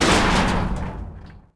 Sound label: cage break metal; impacts explos